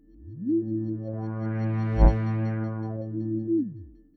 A kind of warp sound, that might be useful as a sound effect for a sci-fi game.